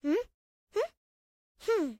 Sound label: build Idle; Talking Tom Gold Run